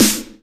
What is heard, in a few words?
Snare; VEC1; Club